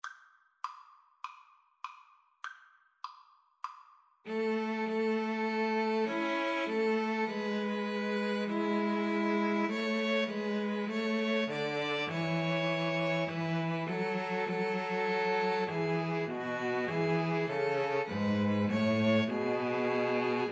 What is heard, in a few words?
String trio